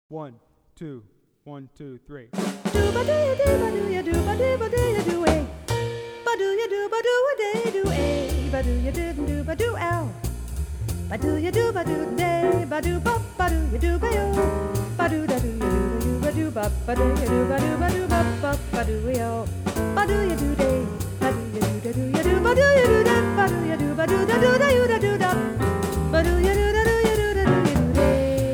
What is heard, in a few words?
Saxophone; Jazz Conception For Saxophone; 21 Solo Etudes (Eb Saxo)